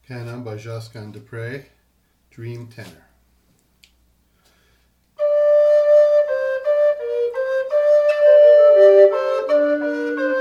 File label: Tenors, renaissance recorders; Josquin